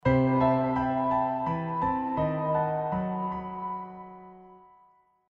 Defeat; lucadian chronicles

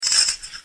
gold sound

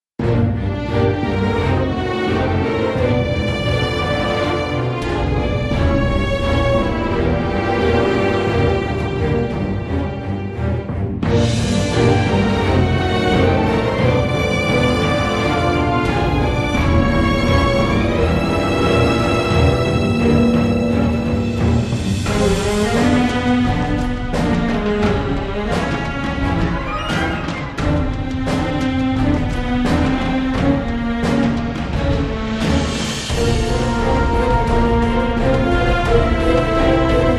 dungeon

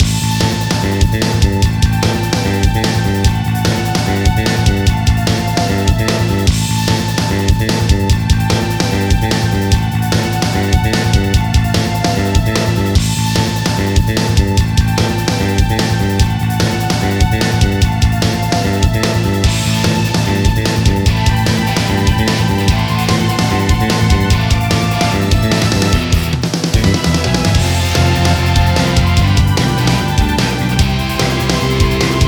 battle loop long intro low

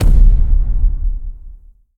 IMPACT SWEETENER Large

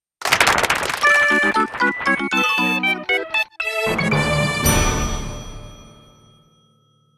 Clear Fanfare Boss; Super Mario Maker

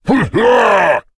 Creatures Fight Appear; Mighty Party; Android

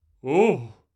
Startle

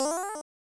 Item Chime; Magic Bag; Krusty's Super Funhouse

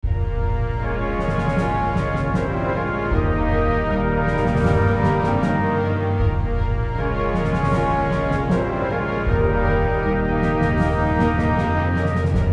Mission Successful Music - Axis

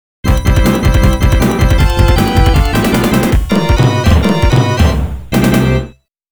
Player Wins; Dragon Ball Z Super Battle 1 & 2; arcade; Winner Screen

